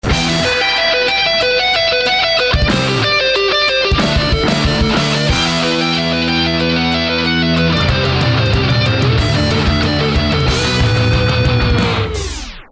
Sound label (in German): Klingelton Classic Rock